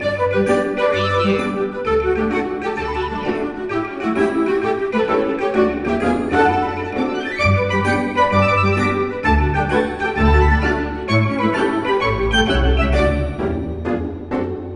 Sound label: playground of happiness loop